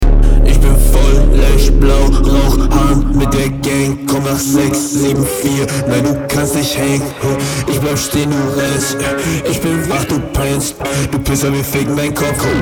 autotune stoner; Rap Vocals

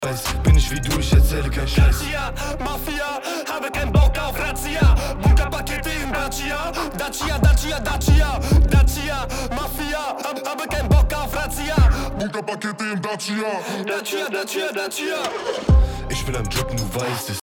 autotune rap main backup dry; Rap Vocals

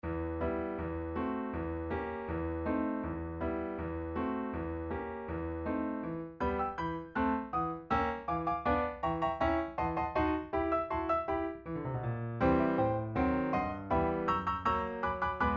boesendorfer 290; zuckerfee

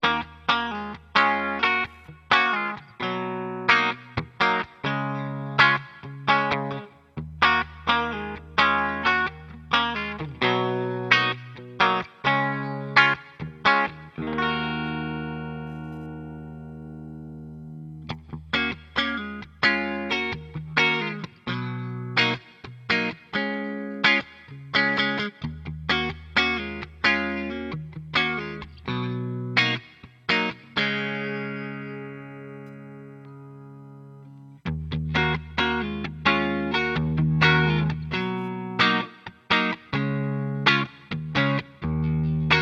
clean, asat classic nitro 3-ts

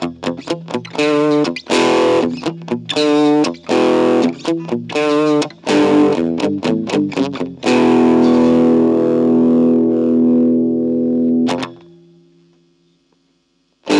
gain full

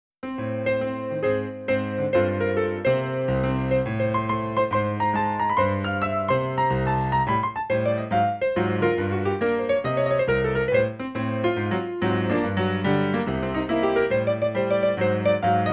jazz piano

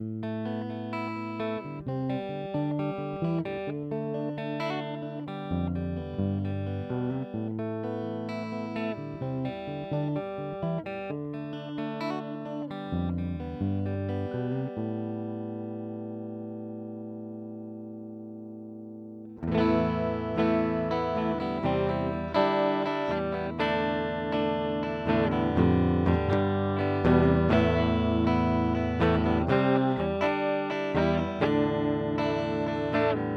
harley benton cst-24 clean neck di

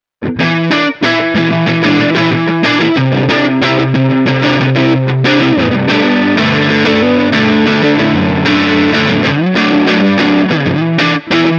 harley benton dnafx git

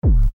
harmonics kick master more dynamic; Analog Saturation Processor; Softube

